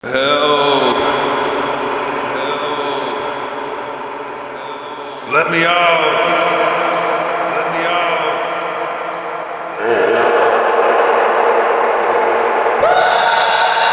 dist pain

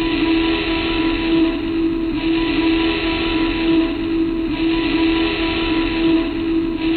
alarm siren loop; svencoop; ambience

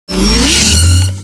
powerup

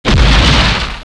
ram wall, tank

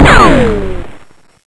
ric, debris

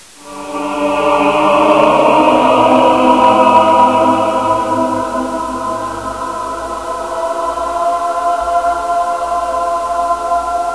ahh

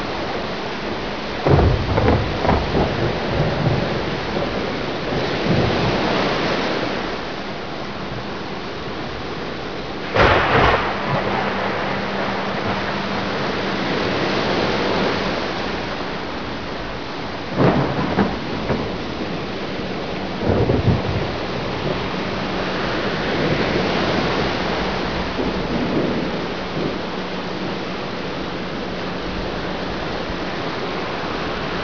storm loop

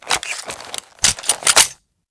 reload mp5; cstrike

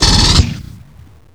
fire, sentry; svencoop; amckern; avp10-guns

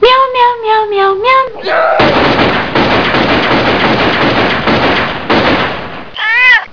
dead cat; dmc

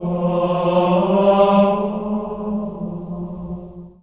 chant; svencoop; sc royals

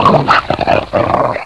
attack; svencoop; perro

